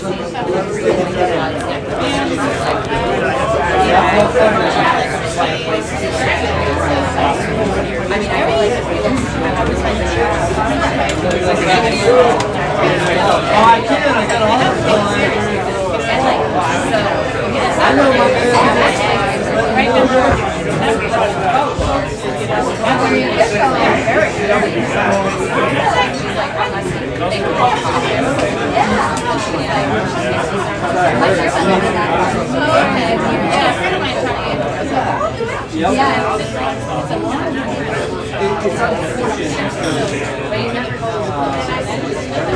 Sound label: Bar Room Background